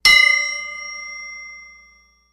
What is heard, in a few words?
Ding Metallic Hit, chimes & bells